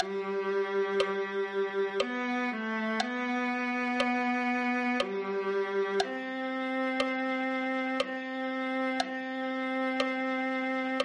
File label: viola